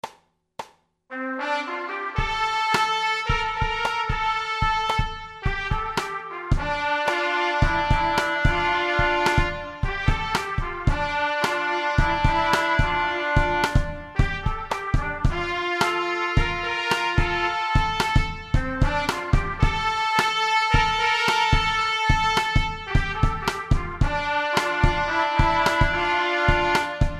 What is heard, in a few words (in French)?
trompette